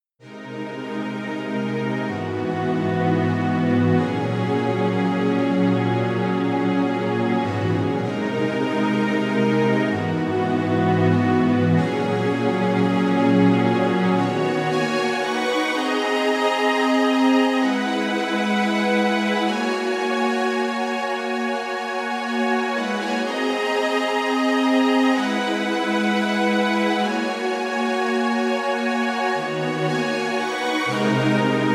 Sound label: Fast Orchestra